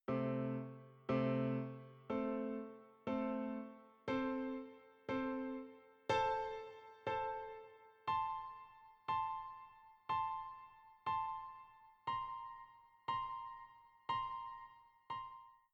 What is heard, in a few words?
Chords; Brahms Feldeinsamkeit